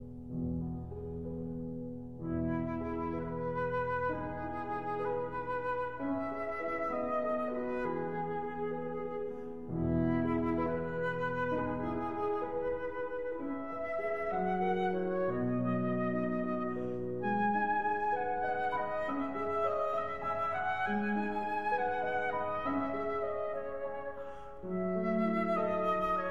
Berceuse (Lullaby) for flute and piano

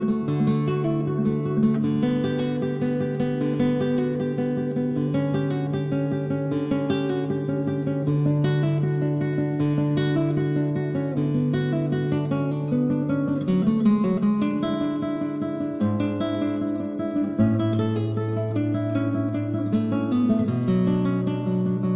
Cello Suite No. 1- Prelude

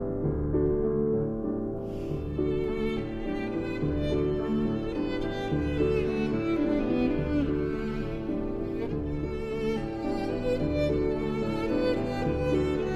Violin and Piano in D

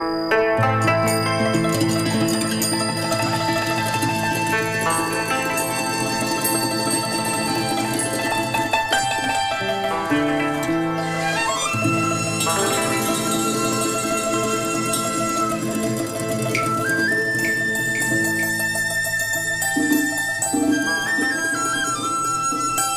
TRADITIONAL Chinese, Picking Flowers

